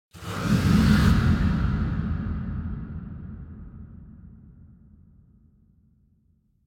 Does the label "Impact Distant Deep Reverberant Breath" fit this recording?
Yes